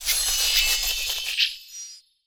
Death

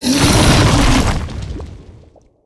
Living Lava Attack

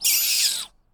Rat Death

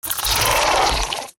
Scarab Beetle Attack

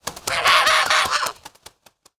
Badluck Albatross Play

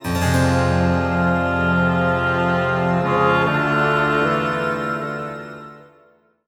Play Stinger; Gilneas

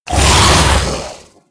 Attack

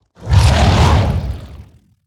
Attack

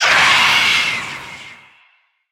Diseased Vulture, Death

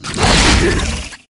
Attack

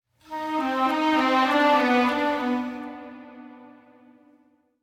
Play Stinger; Transfer Student

